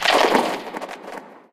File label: player exit jump paint